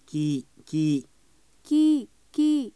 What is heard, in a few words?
monkey